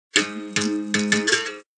Μπεριμπάου

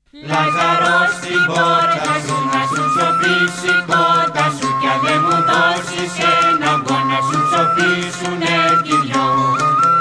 Κάλαντα Λαζάρου Φούρνων Ικαρίας; Δόμνα Σαμίου

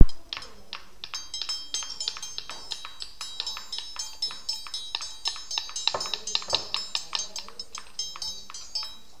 τρίγωνο καρύδι

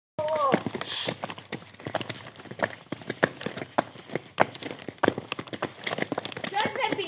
περπάτημα σε πέτρες

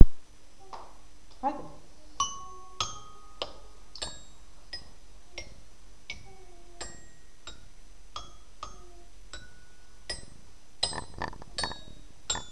μεταλλόφωνο

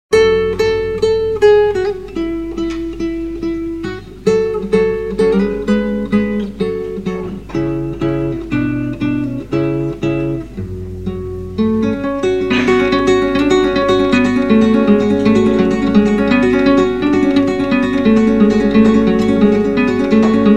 Ηχητικό δείγμα κιθάρας

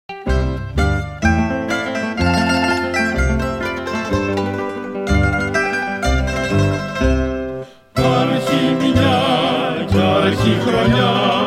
Κάλαντα Πρωτοχρονιάς Ζακύνθου

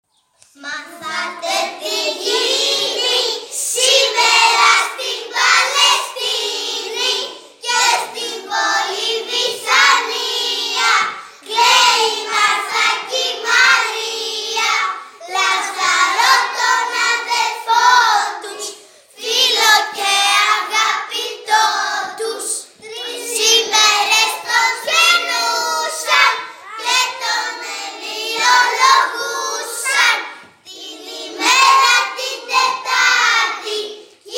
Κάλαντα Λαζάρου

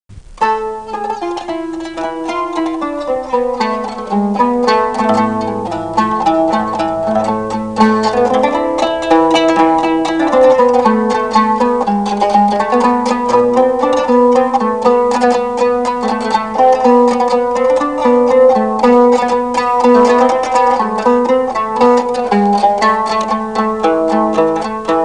Κανονάκι

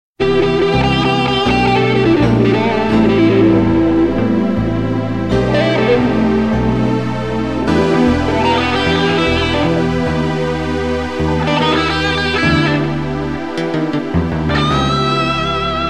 Ηλεκτρική κιθάρα

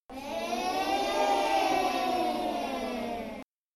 εεεεε

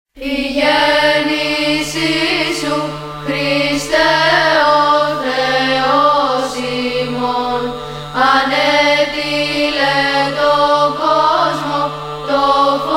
Η Γέννησις Σου Χριστέ ο Θεός

